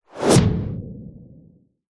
sound design whoosh short fast powerful crack long tail; zapsplat